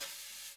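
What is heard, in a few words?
brush single; Northstar - New Gold VOL-2; 30S BRUSH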